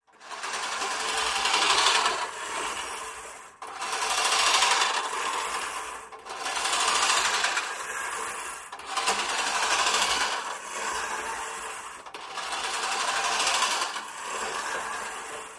Lawnmower Hand Pushed, Old hand mower - various grass cutting; various grass cutting moves